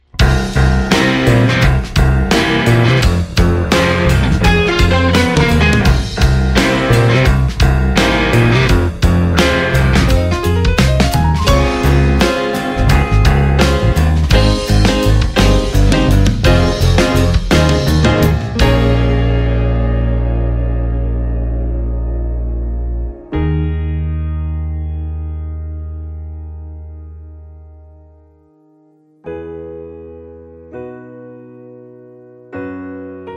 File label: karaoke